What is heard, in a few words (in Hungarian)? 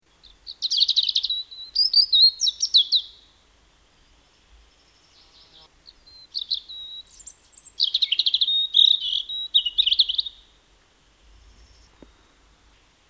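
Vörösbegy; Madarak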